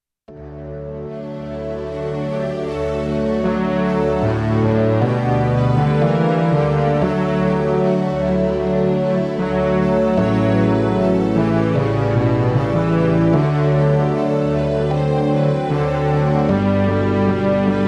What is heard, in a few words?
jesus whispers peace midi